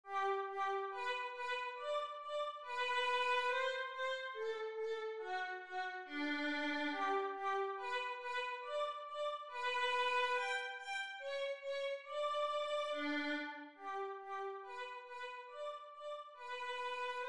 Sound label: surprise violin